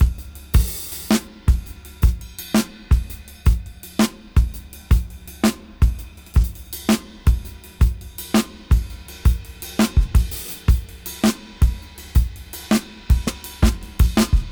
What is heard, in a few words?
Funkragga 82 BPM; AMG - Keith LeBlanc Phat Butt Jams